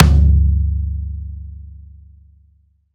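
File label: RR Floor Tom, Roland BK-5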